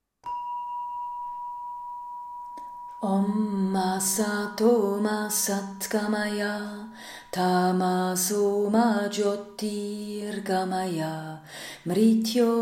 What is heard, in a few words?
Pavamana Mantra